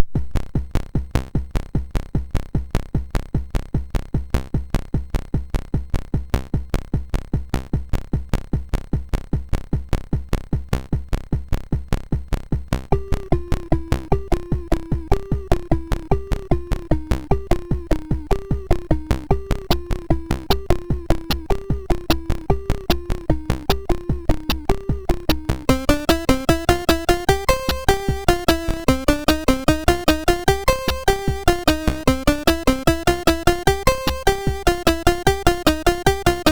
sid, MOS6581R2; Rocket Smash

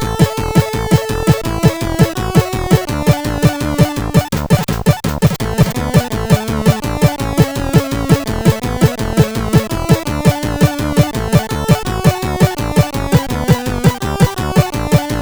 Happy Birthday, sid CSG8580R5

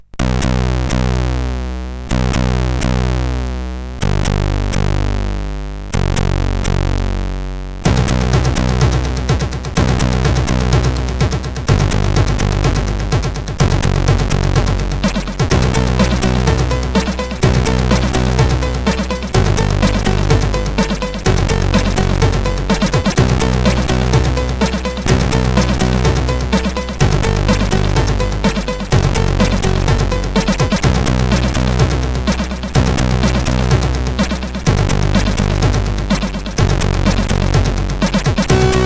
Scrollie Times tune, CSG8580R5; Sudden Death

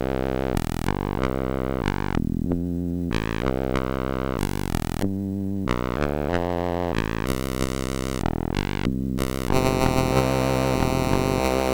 Blues, MOS6581R4

